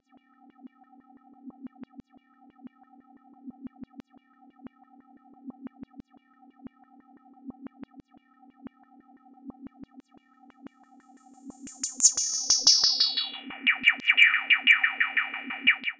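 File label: feedback container fiddling with parameters; reaper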